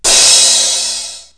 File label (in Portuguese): BATERIA